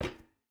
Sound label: footstep boots metal run; Rust